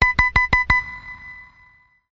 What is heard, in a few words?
sms, rphone